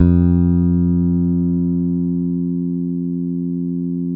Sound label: FBASS, Electric Basses